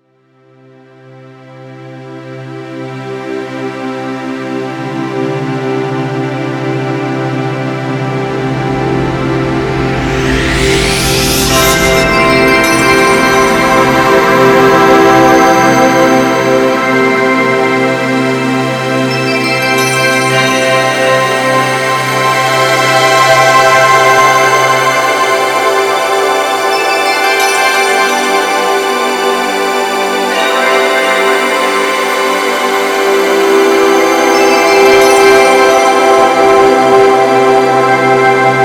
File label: Bed Strings Bells Swell; 5.1 STEREO MIX; Blastwave